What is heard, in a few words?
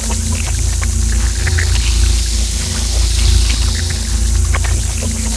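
poison travel loop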